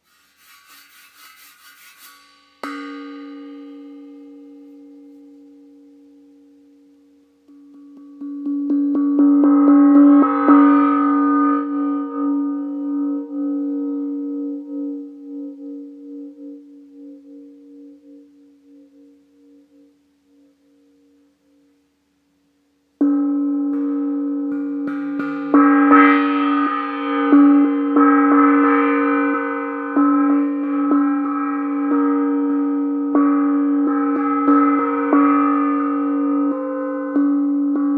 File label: Gong